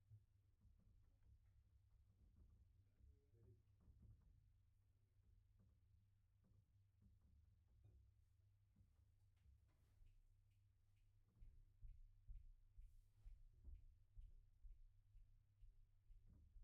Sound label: Piano B